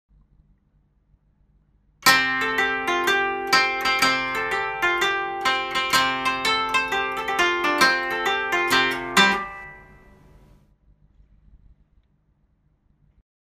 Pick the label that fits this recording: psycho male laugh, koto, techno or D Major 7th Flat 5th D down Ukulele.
koto